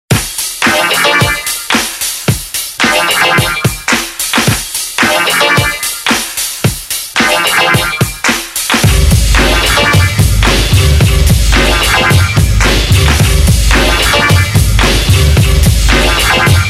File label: Funky Force 110BPM